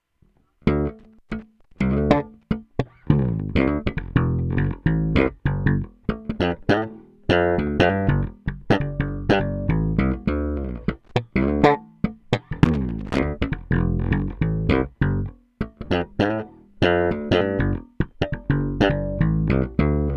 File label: Bridge Slap Tone UP